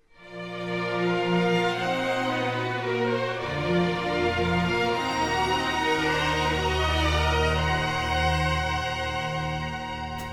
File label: Swing